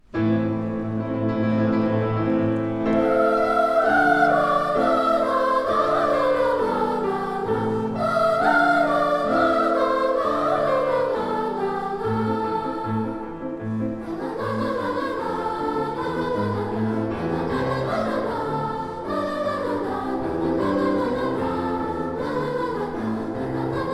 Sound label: Peasant Dance Three part treble